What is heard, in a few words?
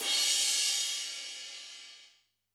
Crash, drum